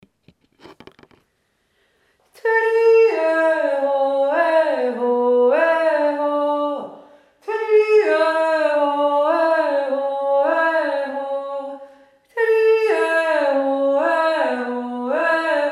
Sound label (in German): dirndljodler